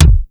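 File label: bass drum analog hard; 300 Drum Machines; Yamaha Motif ES-Series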